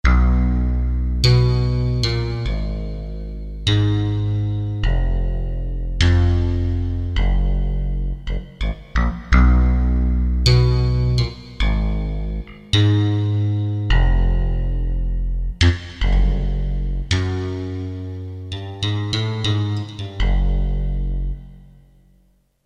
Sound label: Twangy, BASS, Kurzweil K2000; Kurzweil K2000 Contemporary ROM; Contemporary ROM Programs and Demos diskette